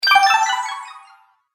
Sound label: Magic Purchase Delay Ding